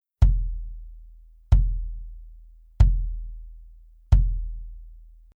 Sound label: BASS Reso Soft Felt; evans; naciagi do centrali rezonujacy